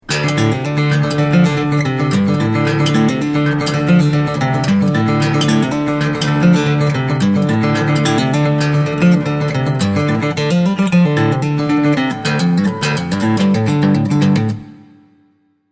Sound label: Riff